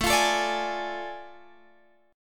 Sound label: A Major 7th Suspended 4th Sharp 5th